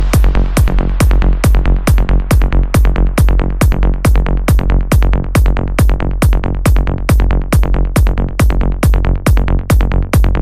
ringtone

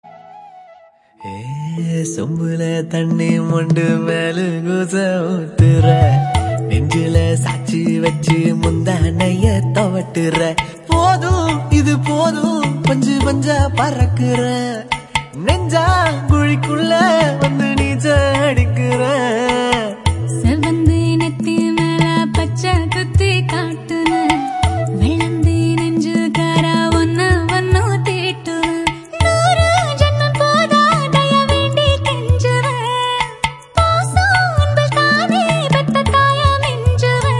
Tamil Song

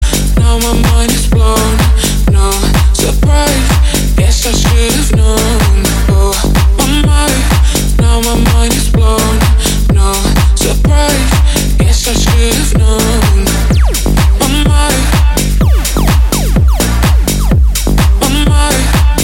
Tech House Ringtone

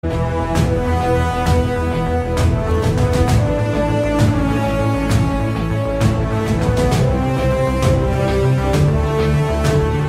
BGM Instrumental Ringtone; Inside Edge

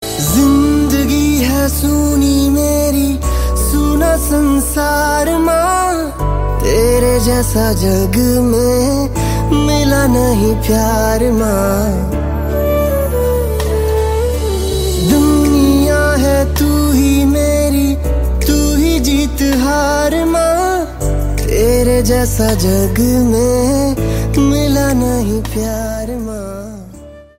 Ringtone Hindi Music

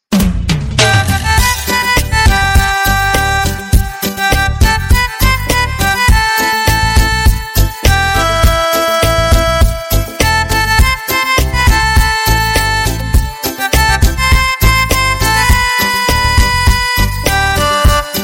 Remix Music

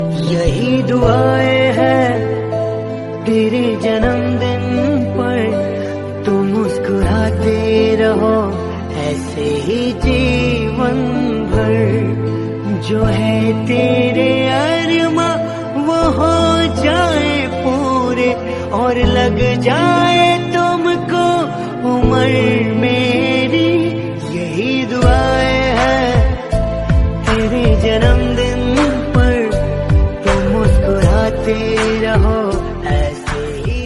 Happy Birthday Songs Hindi Ringtone

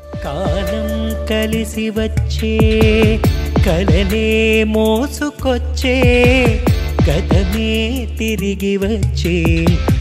Telugu Love

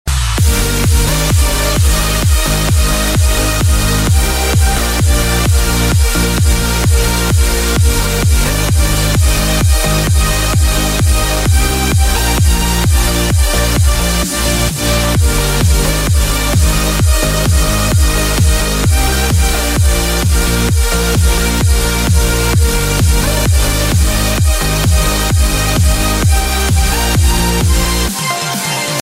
ext mix ringtone

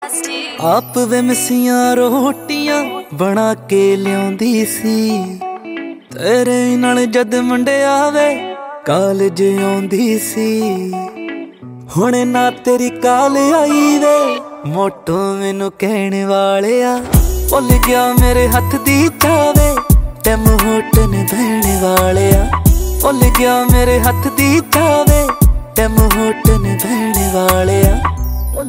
punjabi ringtone

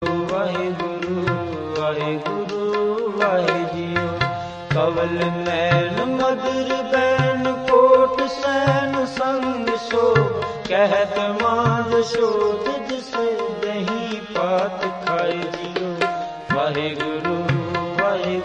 waheguru waheguru jaap

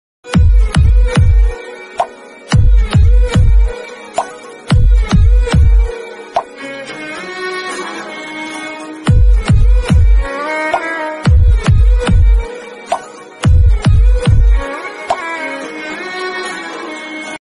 Heartbeat Bgm Ringtone; 3 Movie

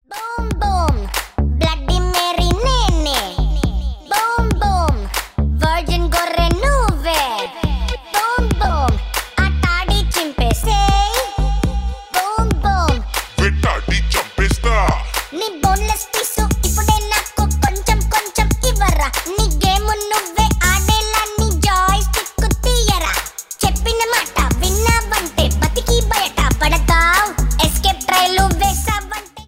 Telugu

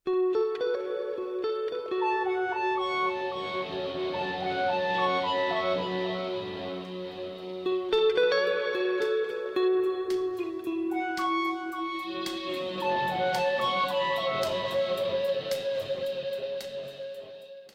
Smooth Guitar Ringtone

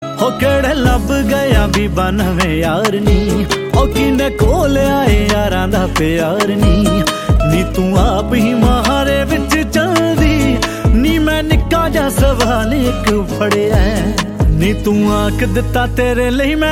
Punjabi

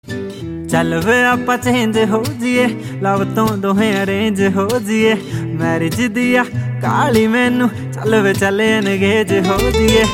Punjabi

